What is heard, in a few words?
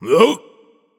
grunt attack; smite; kumbhakarna